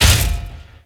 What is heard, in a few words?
Garage Door Close; Gameplay; Warframe; Venus Open World